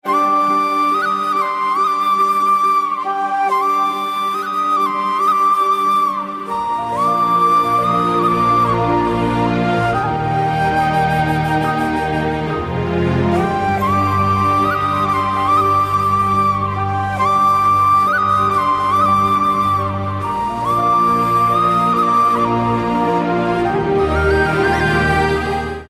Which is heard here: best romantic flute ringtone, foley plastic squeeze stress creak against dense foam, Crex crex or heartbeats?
best romantic flute ringtone